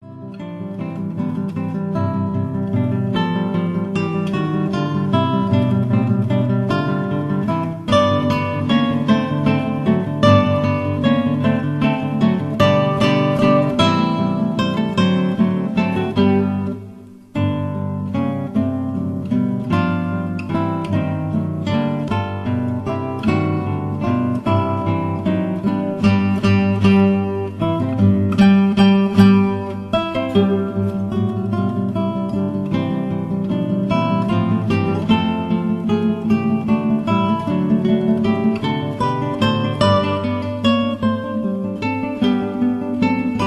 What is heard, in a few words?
Menuett 4 Guitars